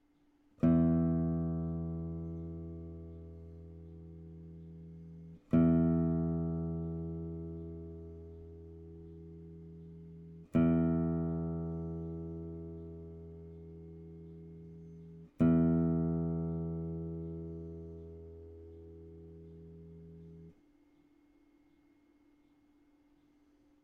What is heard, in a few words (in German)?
stimmtoene der gitarre